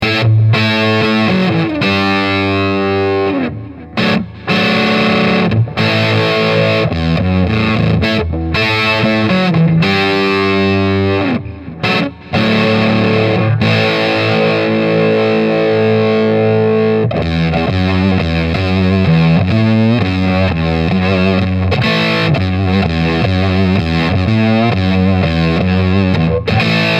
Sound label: Jtm45